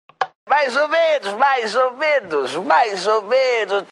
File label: match